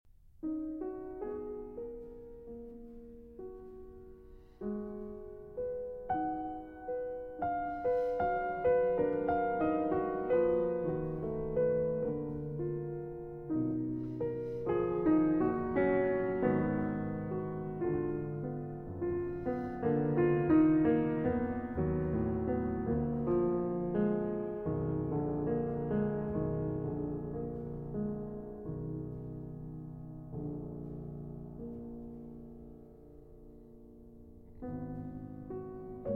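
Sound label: Preludes, in E-Flat Minor, Andante, Piano Works; 9 Preludes, Op. 1; Szymanowski; Ma Non Troppo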